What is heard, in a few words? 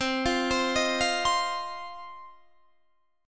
C Minor Double Flat 5th C down Guitar DADGAD